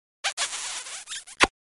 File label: kissing sound